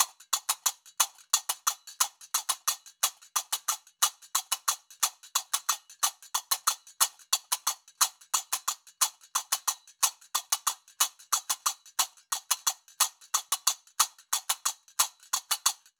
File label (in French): loop ternaire tempo 120; Qaraqab; qraqeb; Maroc